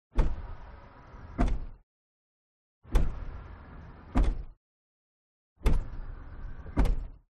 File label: GARAGE Car Lift Down; CONSTRUCTION; smartsound